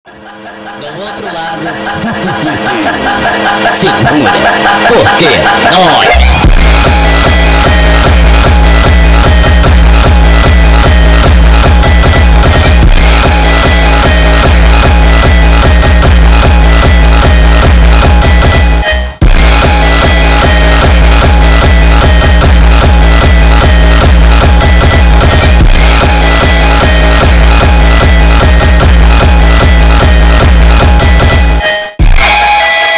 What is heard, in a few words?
Beat Mata Noia hardstyle